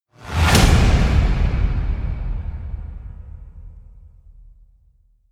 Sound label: metallic slams with whoosh; aggressor